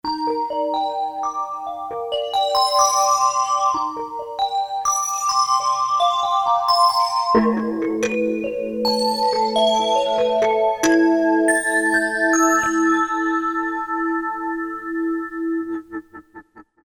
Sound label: Kit, Percussion; Korg DSS-1; Kit2Trec